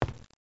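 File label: zvuki shagov, footsteps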